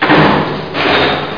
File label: ambi; duke